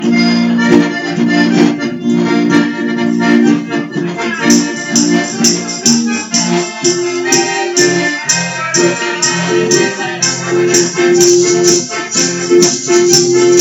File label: Polka Jam; Kyus Music